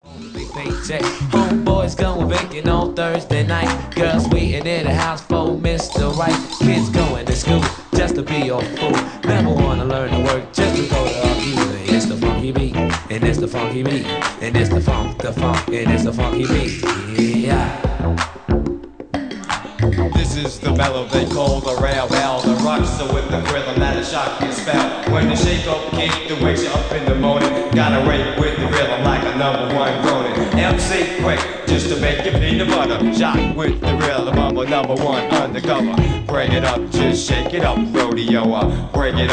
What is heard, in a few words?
beat bop ( vocal)